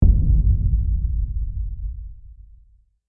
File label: Hit Lo Big Bottom; Brand X Music; Brand X Music Catalogue - Editor's Essentials